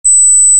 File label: 8000 Mhz Beep